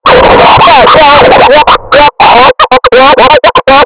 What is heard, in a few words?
DJ Scratching Record Mix Distorted and Loud